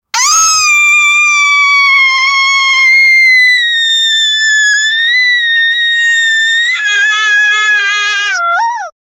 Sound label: sister child screaming